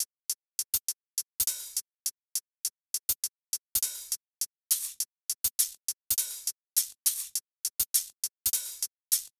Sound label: Drums, 102 Fmin